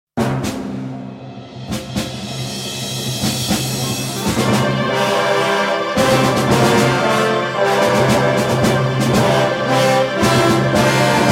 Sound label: French Carol